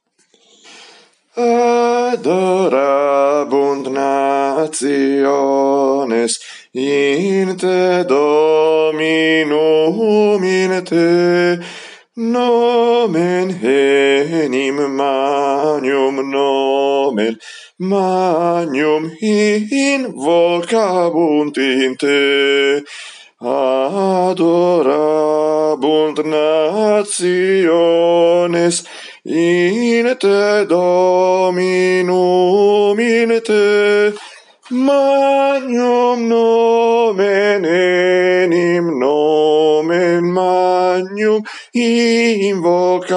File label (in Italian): soprani; Adorabunt